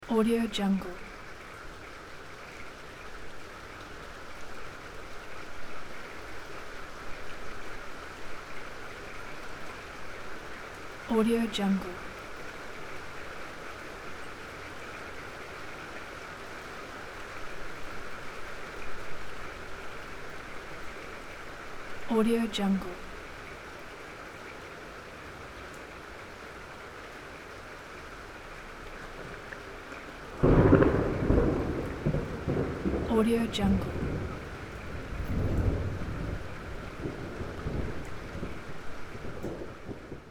distant rain muted thunder strong; بارش باران و صدای رعد

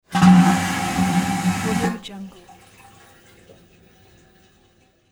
cruise ship toilet flush

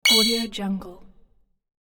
interface sci-fi entry accept high vibrato; interface sounds; پنل کنترل گجت

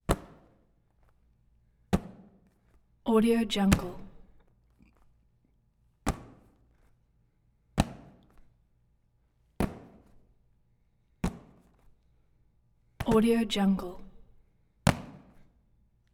sports volleyball volleyball catch; دریافت توپ با ساعد; human sounds

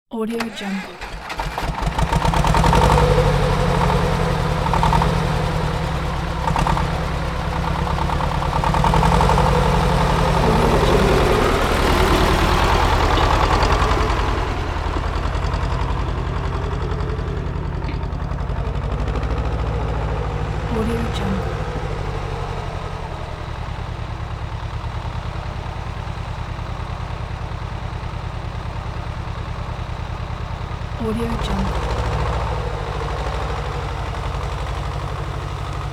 tractor starting and ploughing; industrial sounds

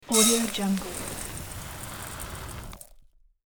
foley beans spill large; domestic sounds

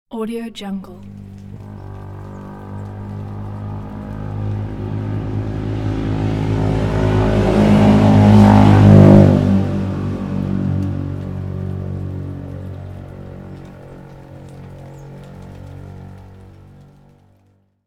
motorbike passing on rainy road

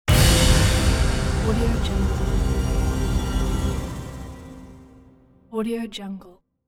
powerful cinematic orchestral terror stab; transitions and movement

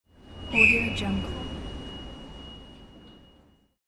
train conductor whistle

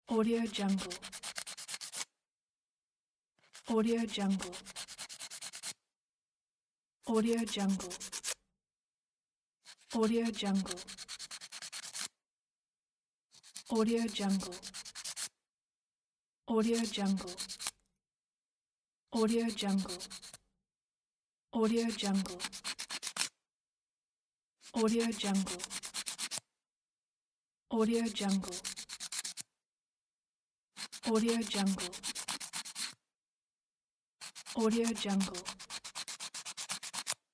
filing fingernails; human sounds; سوهان زدن ناخنها

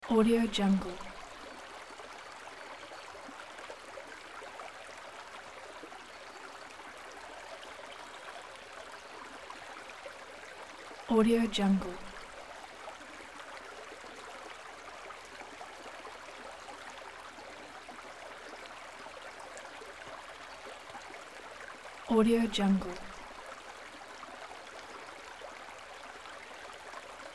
stream forest small bubbly calm

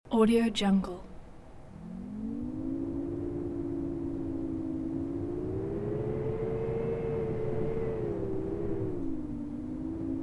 howling wind draft loop; زوزه باد

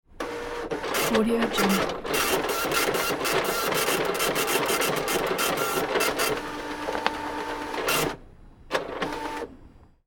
dot matrix printer printout; industrial sounds

